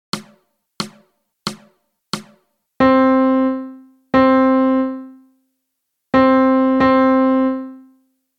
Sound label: Melody; Lesson 1 Medium; Read Rhythms